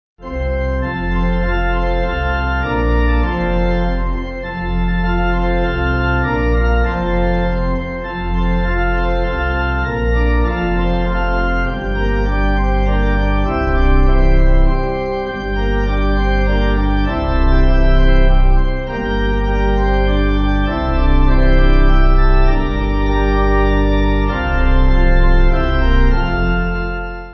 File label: Lord Make Me An, Pipe